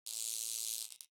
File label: substation oneshot; ambient; Counter Strike Model Pack; CS:GO; nuke